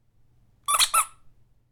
Whip Jouet

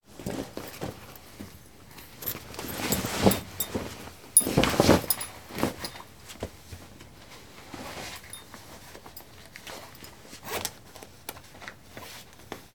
Taking Trousers With Belt

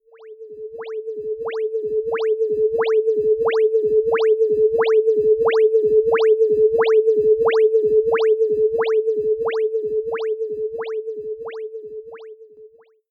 Spaceship

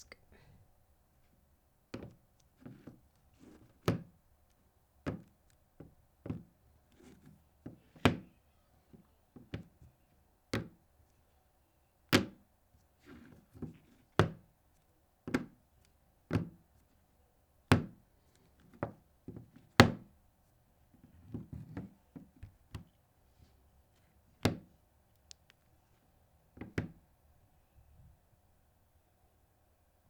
Dropping A Phone On A Wooden Desk

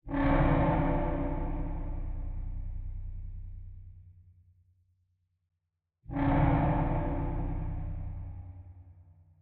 Horror Bass Drone

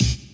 Semi Kick

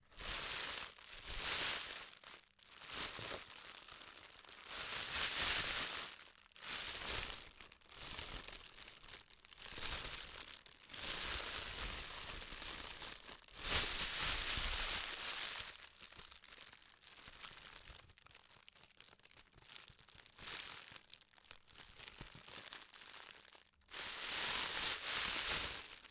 Plastic Bag

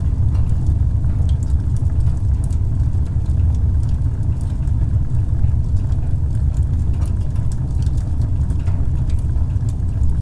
Fire Burning Loop

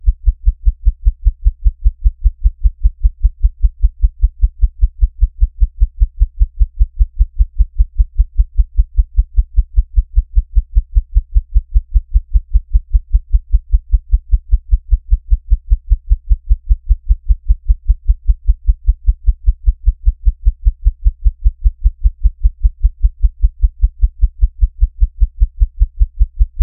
Synthetic Wristwatch

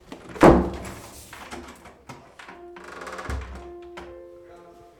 Old Door Closing

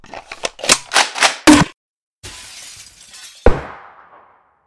Flashbang through window

